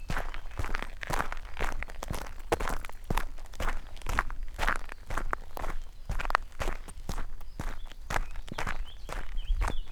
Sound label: Footsteps On Light Gravel Path Stride